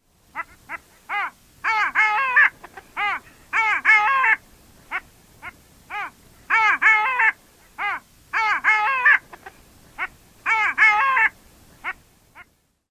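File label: calls of courting male, long-tailed duck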